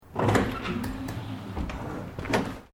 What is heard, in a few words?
Door Aluminium Glass Pivoting Open, Industrial doors; Miscellaneous Doors; Sound Effects:Doors